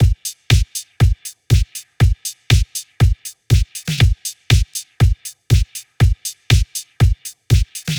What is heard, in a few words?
120 Nu Tropical, Electric Drums; Loops; Touch Loops; CosmicFunk